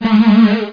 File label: Buzz; BuzzOb A.LP.1